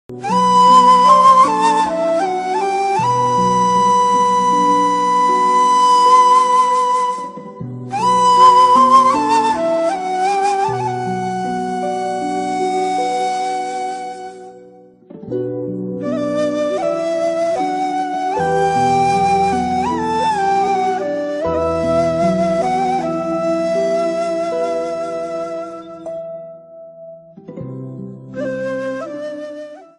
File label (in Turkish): islami fon muzigi; zil sesleri